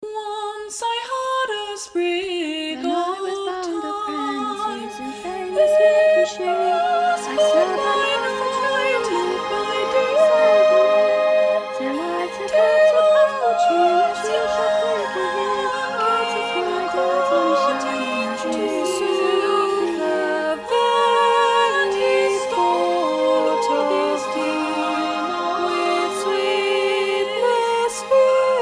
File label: 4 part Harmony with soundscape